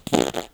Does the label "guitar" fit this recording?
No